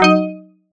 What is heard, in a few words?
strum reward